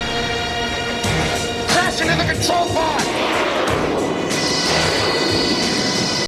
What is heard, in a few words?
crash